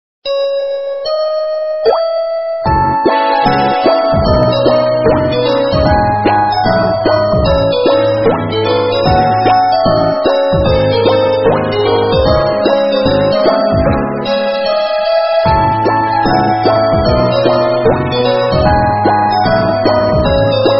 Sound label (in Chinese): 铃声 韩国可爱泡泡铃声